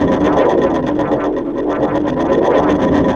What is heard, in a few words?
GEIGER; Spectrasonic Distorted Reality 1